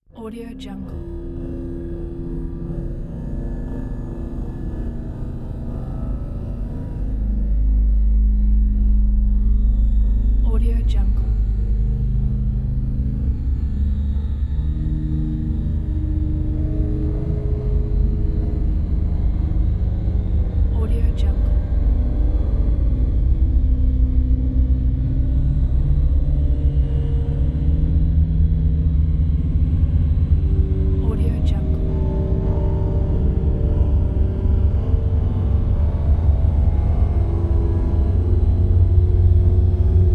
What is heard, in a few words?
scifi horror anxiety